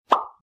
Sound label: pop up sound meme